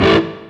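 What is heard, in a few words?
synth guitar; E-MU Formula 4000 Series Vol. 5; Protozoa; Orbit Instrmt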